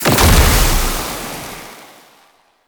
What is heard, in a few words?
Grenade Explosion Toxin; Warframe; Entrati; Alchemy